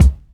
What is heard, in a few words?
rich mids kick drum c key